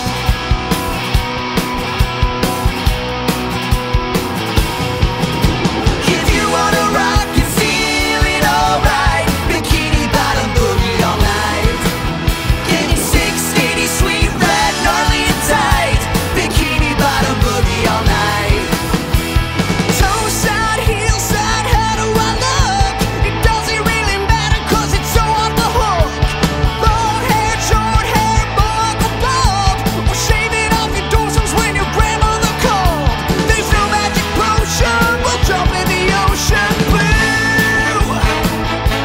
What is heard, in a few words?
with Vocals